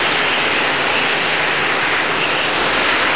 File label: wind; tnpdemo